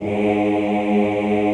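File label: OOH G#1 right, classical choir; Best Service; Extended Classical Choir; OOH MIX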